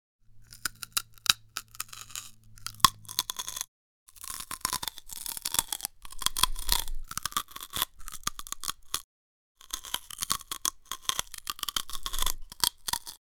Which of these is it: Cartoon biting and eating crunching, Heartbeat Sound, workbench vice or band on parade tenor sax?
Cartoon biting and eating crunching